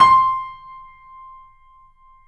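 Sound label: piano, C, right; InVision Interactive - The Piano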